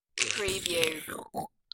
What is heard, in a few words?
Slurp